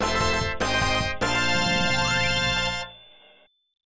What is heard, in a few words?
Point Clear Fanfare; CP System; arcade; Quiz Dragons; Capcom; quiz game